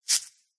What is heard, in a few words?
animals bird nest short hard fast single movement hay bushy